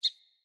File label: Sparrow Single Chirp; Animals; Vocalizations